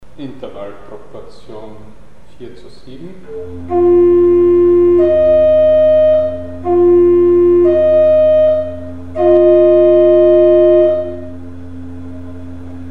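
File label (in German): Naturseptime mit Frequenzverhältnis 4 zu 7; Mikrobuch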